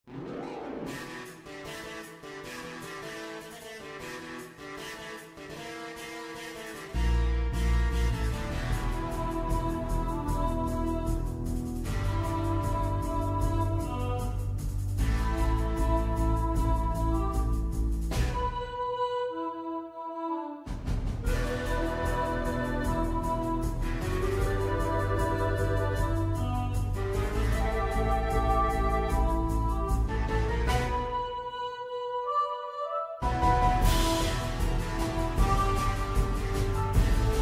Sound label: instrumental with piano